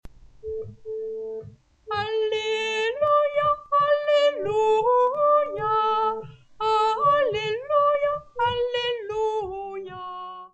Alleluia Basse

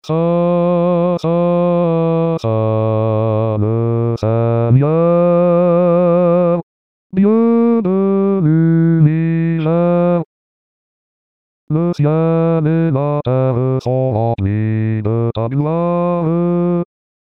Saint le Seigneur Basse, Chorale; Mozart; Paques

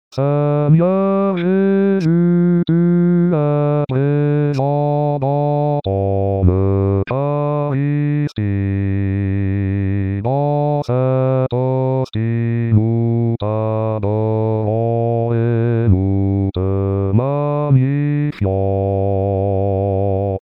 basse, chorale

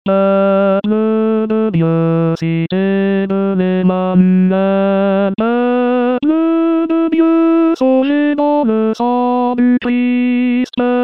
Tenor, Chorale; Eglise du Seigneur; Pentecote

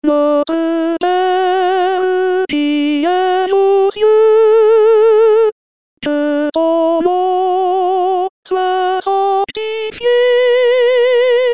Notre Pere Soprano, Chorale; Pentecote

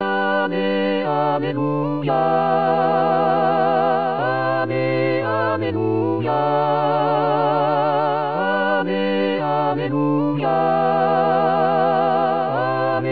Alleluia, Chorale; Messe de la Contemplation

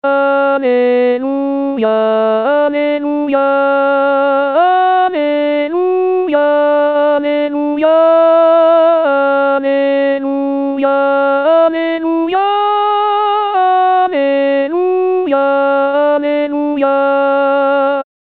Alleluia Festif, Chorale; 2016 Paques

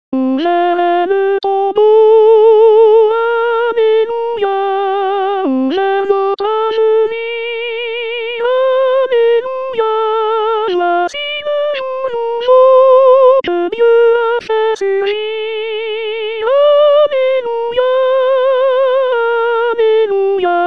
Ouvert est le tombeau Soprano; Paques

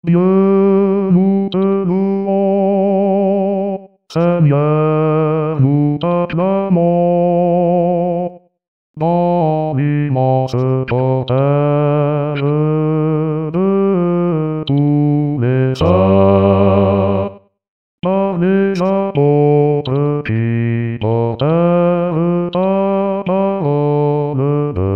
Dieu nous te louons 4 voix; 2016 Toussaint

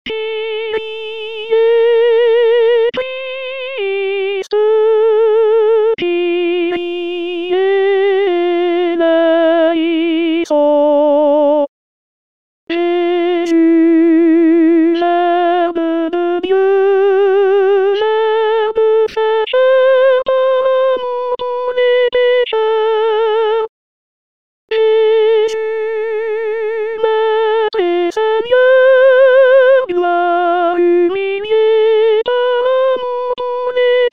Jesus Verbe de Dieu Soprano

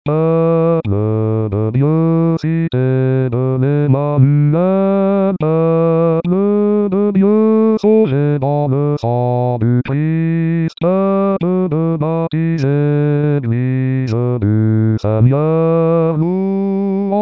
Eglise du Seigneur Basse, Chorale; Pentecote

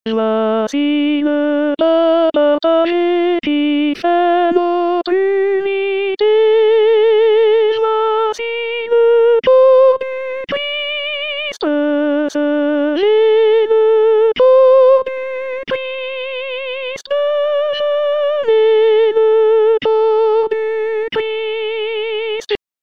Voici le pain partage Soprano, Chorale

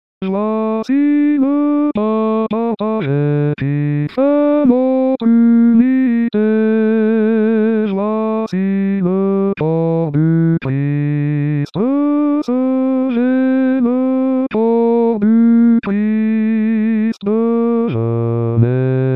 Voici le pain partage Basse, Chorale

